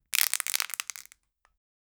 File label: loud crack of plastic cup